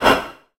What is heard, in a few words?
short breath; dark king